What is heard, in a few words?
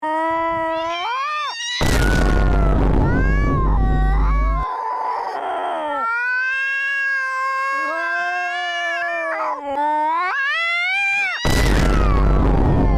goofy ahh cats